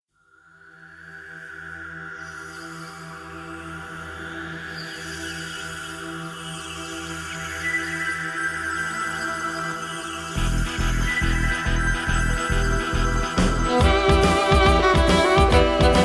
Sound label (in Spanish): contradanza